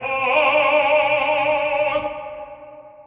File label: Aaaah